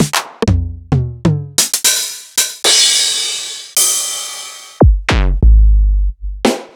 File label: Yamaha CS61